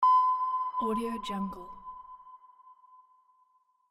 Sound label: radar